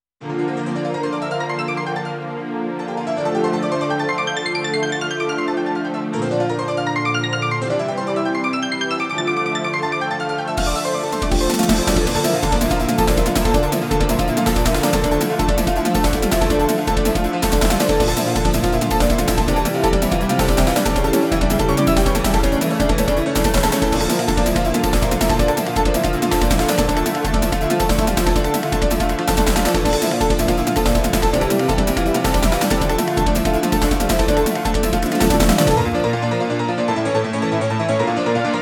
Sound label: SC88Pro